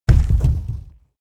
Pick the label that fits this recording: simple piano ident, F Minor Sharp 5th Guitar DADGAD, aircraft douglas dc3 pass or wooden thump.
wooden thump